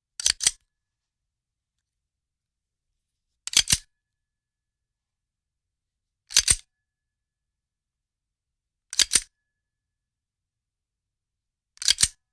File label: handgun cocking